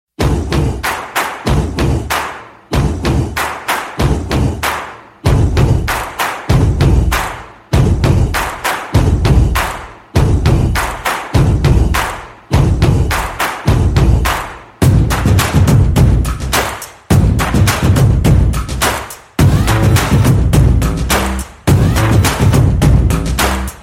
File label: Drum Beats, Telugu Ringtone